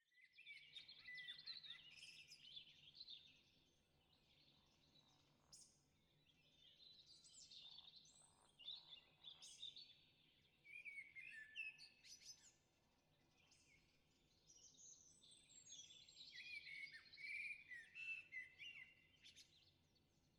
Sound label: blackbird DirAC 5 1